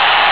crowd; SOMC Family Forum

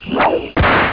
TONGUE, frog; bud frog

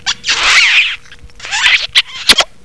KISS

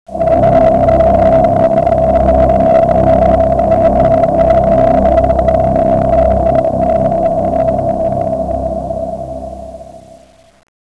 SHARK

